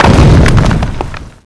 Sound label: Gold Beetle Stone